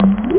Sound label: DRIP; da capo vol1; drums